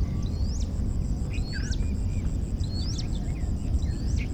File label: Whistle note of a Jungle Prinia